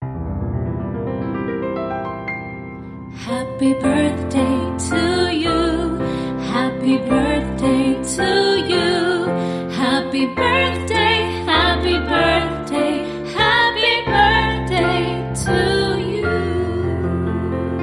Female Vocal with Piano Happy Birthday

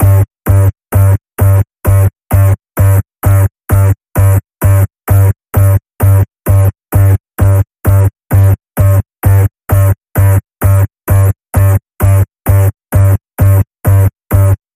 Digital Rewind Pulse, G

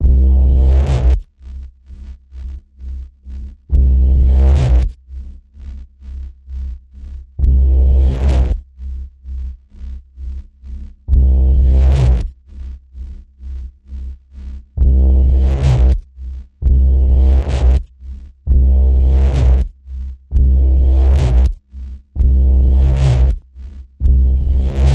Ominous Pulse C, Key of C

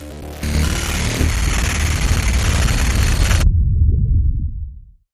Glitch Double Pulses

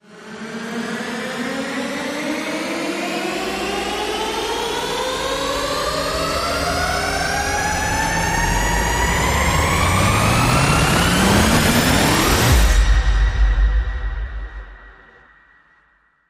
Rise Long

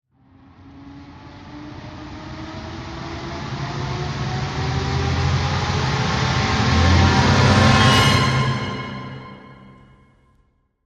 Hi Rise

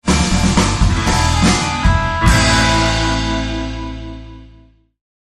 Alien Surfer

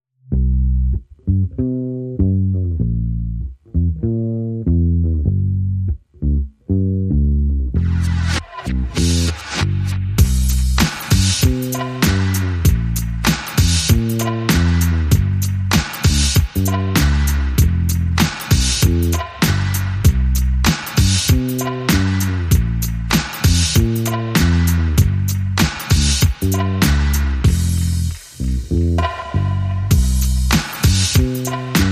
Drums And Bass Mix

